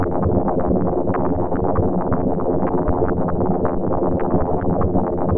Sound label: PROPHE FX, SYNTH FX; AKAI S-Series CD-ROM Sound Library VOL-6